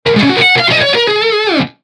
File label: instruments guitar; maoudamashii